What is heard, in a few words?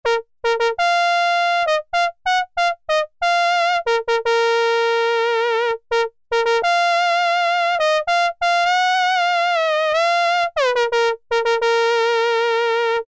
trumpet III, Korg M-500 micro-preset